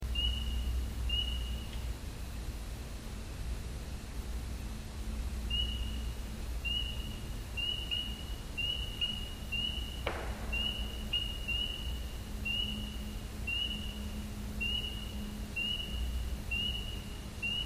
knock, Wood Knocks; Wood knock on ATV trail at 18:48hrs 3-12-10; captured by a recorder that was situated near an ATV trail; Wood knock on ATV trail at 18:48hrs 3-12-10, this one was captured by a recorder that was situated near an ATV trail about 3/8ths mile fro

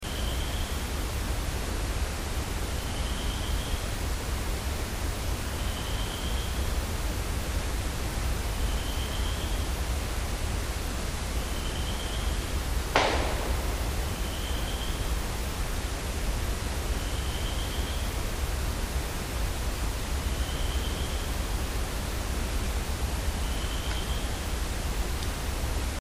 wood knock; Wood knock recorded from the trail behind base camp at 01:20hrs 3-14-10

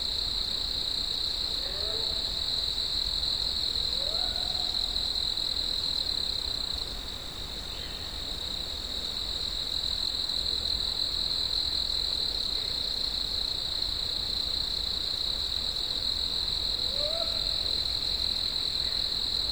whoops; Whoops 02:27hrs on 5-4-08, same as above but from a second location

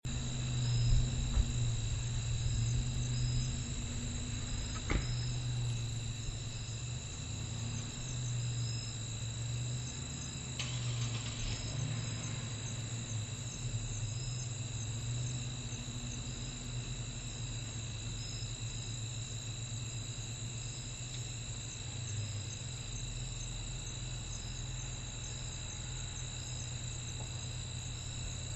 possible knock pumpjack; Knock from the Pump Jack 10-8-11

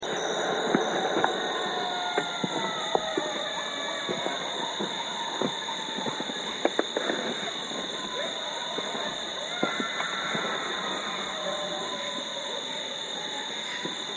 tx screamer; Unknown Vocal; recorded in July 2006, we had just call blasted and it set off a distant pack of coyotes; Here is another oddity that was recorded in July 2006, we had just call blasted and it set off a distant pack of coyotes.

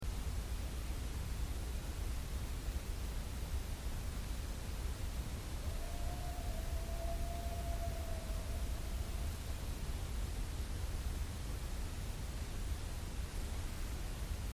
howl; Unidentified Howl 23:06hrs 3-13-10; Bigfoot Research

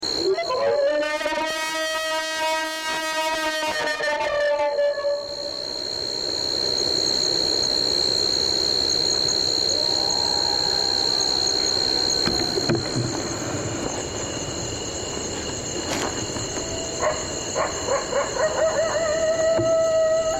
dish coyote response; Parabolic Vocal Capture 10-7-11

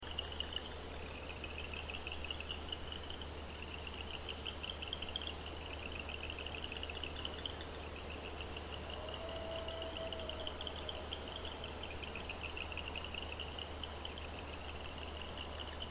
coyote

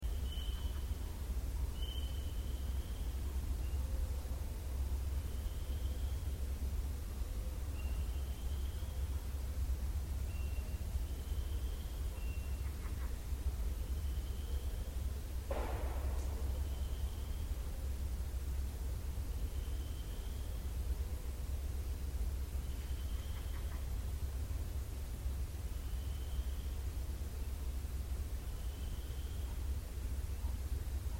woodknock basecamp; Wood knock recorded from base camp at 01:20hrs 3-14-10

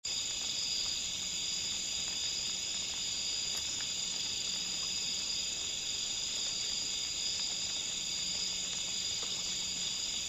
cleaned wood knock; 3AM Sunday 9-13 Wood Knock

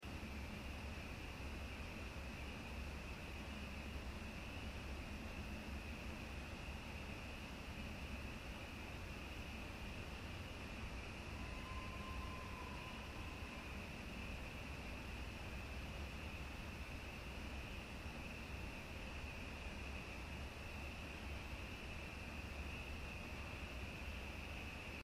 vocal; Louisiana

